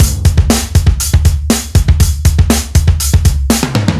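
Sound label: Deep rooted C, drums rock alternative; ударные и барабаны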